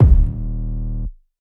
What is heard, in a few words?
long room reverb kick drum single shot d sharp key